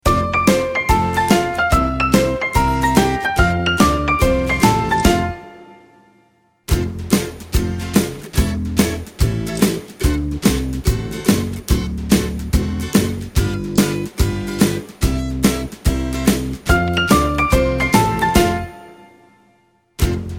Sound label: (F) No Backing Vocals